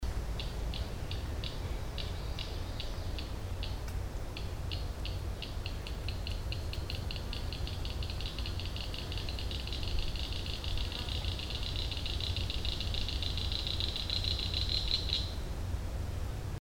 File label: Northern Cricket Frog; Idylwild MD